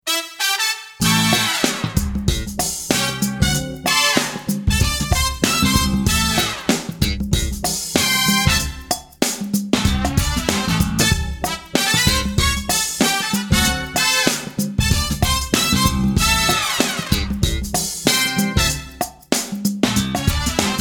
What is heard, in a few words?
Trumpet Section